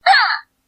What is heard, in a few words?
Wiimote Sound; Lucas